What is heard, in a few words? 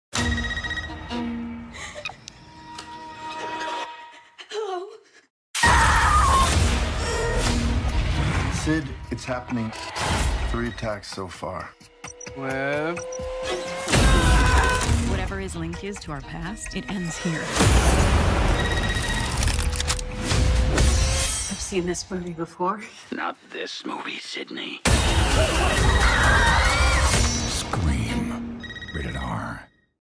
scream